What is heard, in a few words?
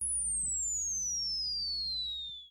Bomb Drop; kotlin-webgl-test